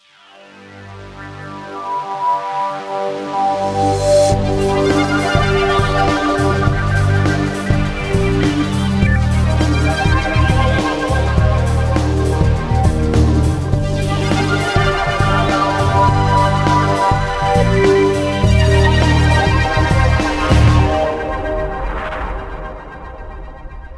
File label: generic victory music; mechwarrior 4 mercs